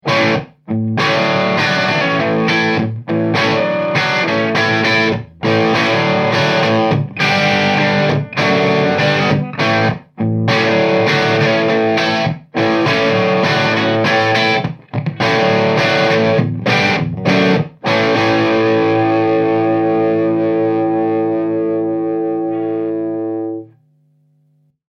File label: Wooden Chamber